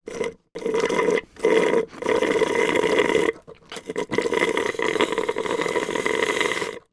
human drinking slurping through straw last dregs soda from fast food cup long